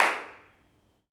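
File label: Reverb in abandoned hospital; IRL Recorded; Reverb Enviroments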